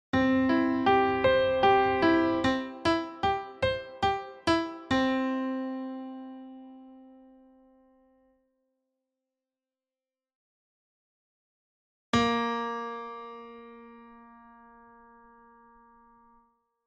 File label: major chord arpeggio legato to staccato